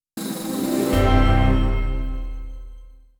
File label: Level End 2 Stars; Shadowhand; macos windows gamerip 2017